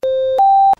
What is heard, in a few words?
Sepura TX